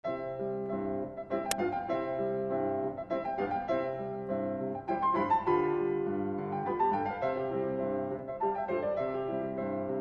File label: Andantino; A. Jensen